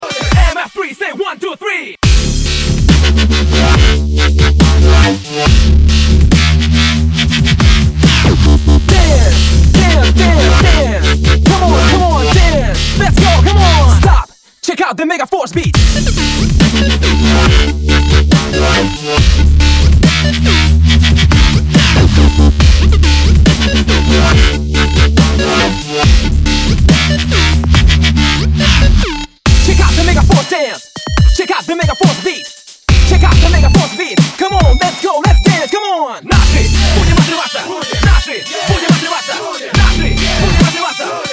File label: Dubstep